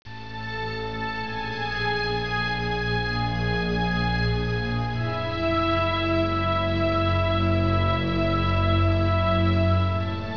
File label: scary music